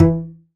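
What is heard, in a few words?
Balalaika E3 Mute; Multisamples; Digital Sound Factory Planet Earth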